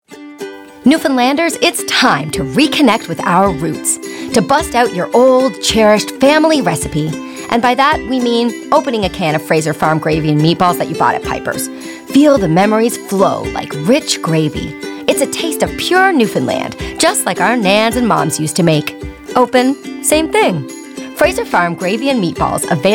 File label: Pipers; Fraser Farm